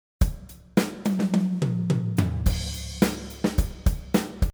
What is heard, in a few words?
drums orig; masaakiwada